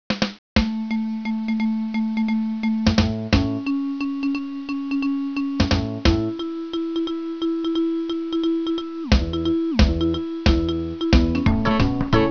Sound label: rock clock